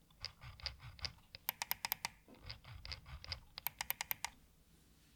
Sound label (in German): Corsair Katar Scrollrad